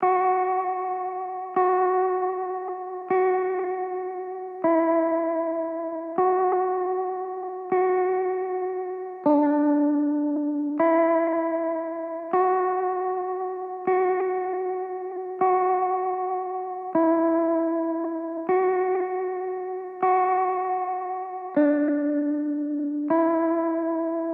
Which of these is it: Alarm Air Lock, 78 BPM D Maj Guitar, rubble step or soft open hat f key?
78 BPM D Maj Guitar